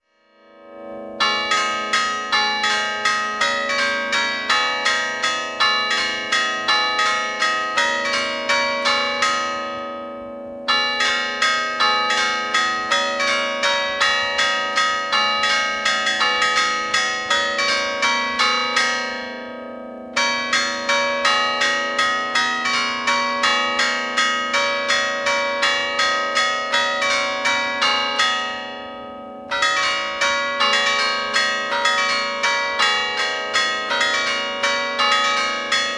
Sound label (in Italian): campane, musica in 6/8 per cinque campane; Santuario della Concezione di Albino; Alberto Carrara; Albino, 18 - 1